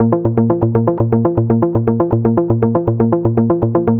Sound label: Progressive Synth, Trance; Sonic Foundry (Sony Creative Software); Trance NRG VOL-2; Progressive Synths